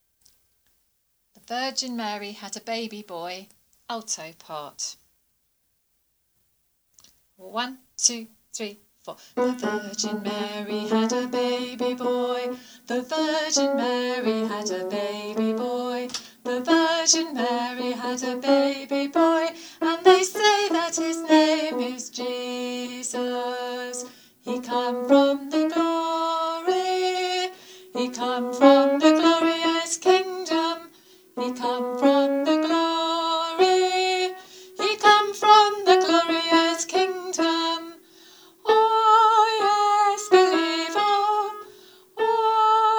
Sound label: The Virgin Mary had a baby boy alto